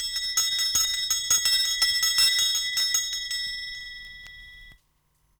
Bell; Drumdrops In Dub VOL-1; SINGLE HITS; DUB FX

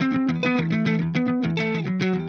Clean Funk Guitar

